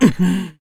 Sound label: Ledge Grab; Ganondorf grabbing onto a ledge